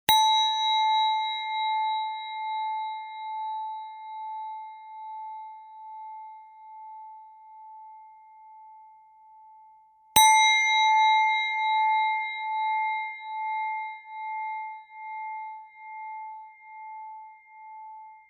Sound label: Bells; english; Prayer & Light Meditation for World Peace